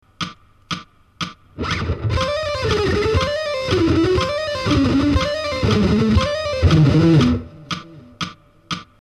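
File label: string skipping fast, guitar; string skipping ex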